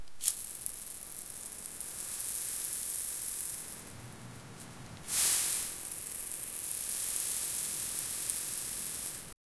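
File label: sand or some powder pouring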